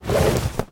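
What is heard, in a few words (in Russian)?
взмах покрывалом над туристическим снаряжением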